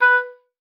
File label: OBOE B4; Xsample VOL-6